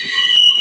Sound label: subway sound; Subway Sound Construction Kit